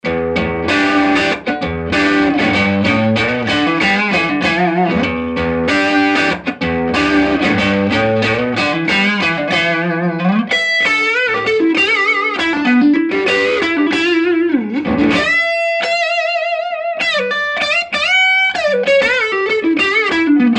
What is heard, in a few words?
crunch neck; schwarzbach